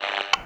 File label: radio static; snds jack gmod